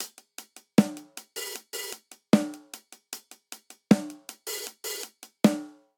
Grunge Rock Snare & Hihat 77 bpm; 4 4; MIDI Clips; Ableton; Factory Packs; Drum Booth

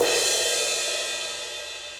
Crash 18 Dark Plate Verb; Drums; Drum Hits; Standard Hits; Cymbal; Ableton; Factory Packs; Session Drums Club

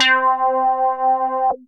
Clavinette, synth keys; Instruments; Greasy Chicken Clavinette; Ableton; Synth Essentials; Operator

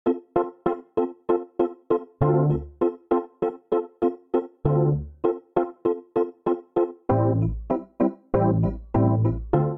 D Minor 86bpm; MIDI Clips; Accompaniment; Rcrd Plnt; Ableton; Factory Packs; Chop and Swing

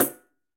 Pandeiro A Finger; Latin Percussion; Drums; Drum Hits; Tambourin; Ableton; Factory Packs

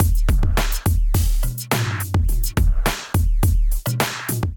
Kit groove 110 bpm; Ableton; Factory Packs; Drum Essentials

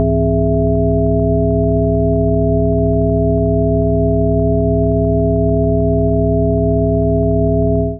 Augmented Fifths C1-E4, Notes and Clusters

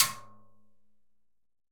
Timb B L Cascara, Timbales; Latin Percussion; Drum Hits; Ableton; Factory Packs

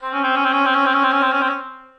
Oboe Solo Half Step Trills; Ableton; Orchestral Woodwinds

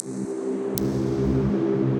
Heavenly Glitch, Ambient & Evolving; Ableton; Glitch and Wash

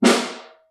SN1 Far, Snare

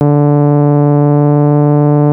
Theremin Vintage; Atmospheres; Ableton Foundation FreePacks

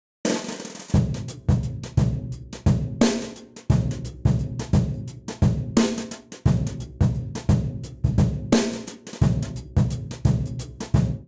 Intro BrushSizzle 87 bpm, Session Drums; Session Drums Club; Ableton; Factory Packs

